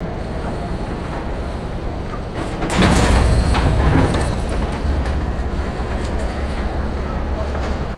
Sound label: heavy ferry docking crash and creaking; boats